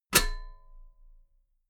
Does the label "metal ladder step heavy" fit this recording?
Yes